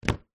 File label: household phone cell samsung galaxy s9 in case set down table; zapsplat